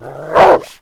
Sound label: wolf; kult